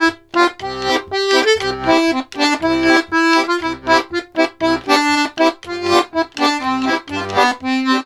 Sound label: POLKA, Accordions; USB Soundscan vol.40; AKAI